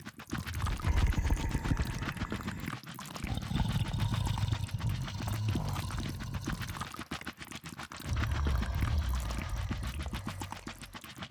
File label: bloat roach idle loop; Hollow Knight: Silksong